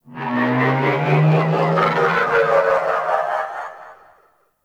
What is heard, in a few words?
Ship Exterior Creature Calls; Warframe; Corpus Ship Remaster